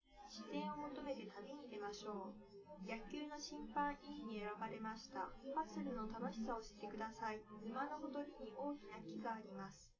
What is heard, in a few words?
female live rec 1m sim; SASSEC07